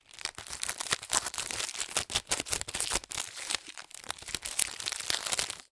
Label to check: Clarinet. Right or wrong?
Wrong